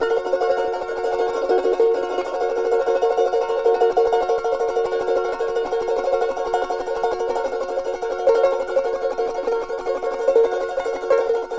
CHAR G MJ TR, charango; Spectrasonics; Hans Zimmer Guitars VOL-2; CHARANGO CHD

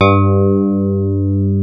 TINE PIANO; E-MU Formula 4000 Series Vol. 3; Analog Odyssey

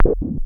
Perc Hit, Noise

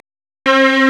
STRNG; CMP - Analogon

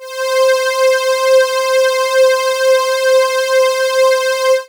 Synths Note C

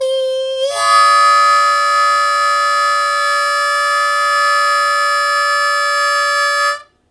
Blues Chord Attk Bb1, Harmonica; Blues Chord Attack; Zero G - Harmonica

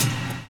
live kick; Univers Sons - Kitch 70s

